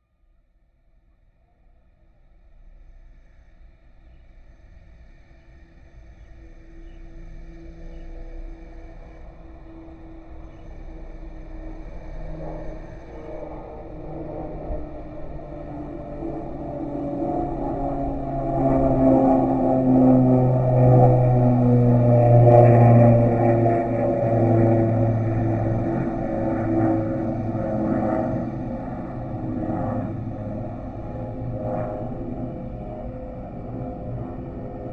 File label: airplane